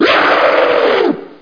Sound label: Yell